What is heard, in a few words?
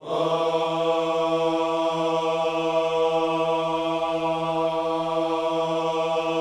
WHOA E 3, Vocal Planet Gospel; Spectrasonics; Vocal Planet Vol.1 Gospel; akai